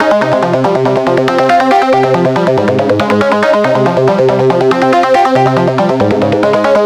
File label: NRG Arp, trance; Sonic Foundry (Sony Creative Software); Trance NRG VOL-1